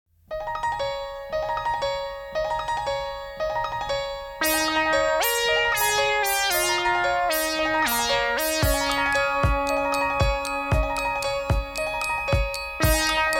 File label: Triangle